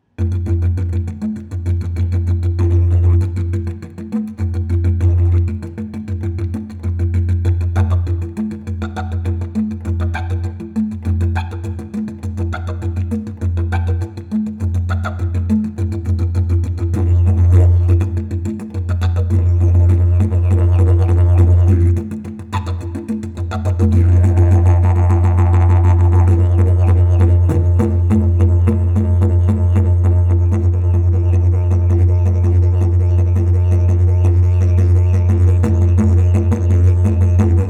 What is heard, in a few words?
didge